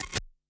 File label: Notifications and Buttons